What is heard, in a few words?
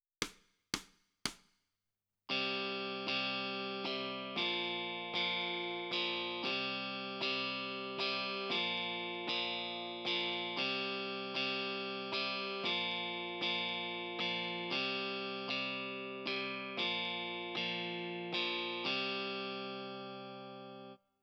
Guitar Left